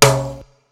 Perc, Boom Bap Drum

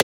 Transient; FLPRO

